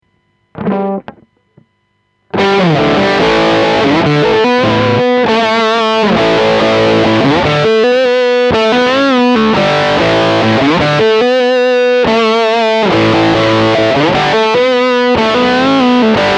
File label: Lead